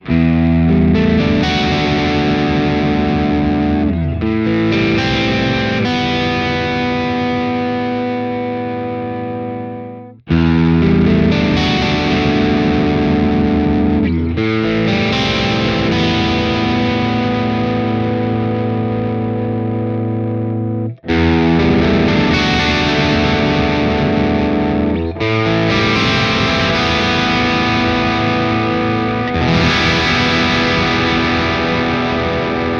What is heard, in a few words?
Schecter C1 Apocalypse